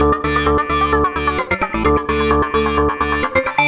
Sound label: Nu Breakz, Underground UK House & Electro, Synths; Sonic Foundry (Sony Creative Software)